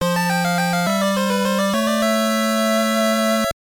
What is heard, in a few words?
Jingle, soundtrack; ultra golf 1991 gb